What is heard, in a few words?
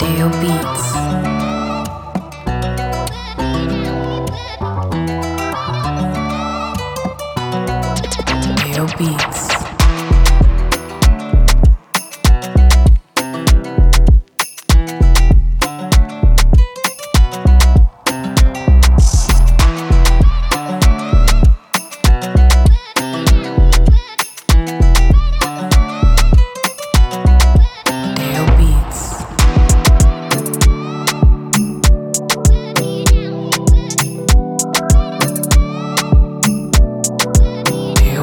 Soulful Type Instrumental